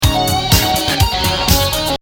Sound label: guitar solo